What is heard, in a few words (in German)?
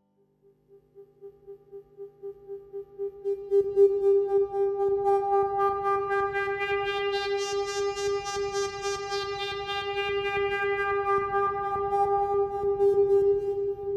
filterspiel, Trautonium